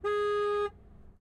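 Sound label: fiat 500 exterior horn short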